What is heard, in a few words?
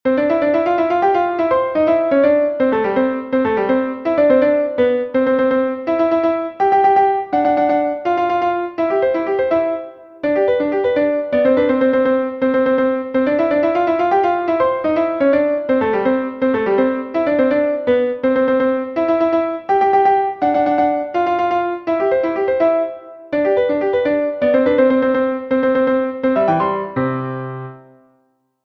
Piano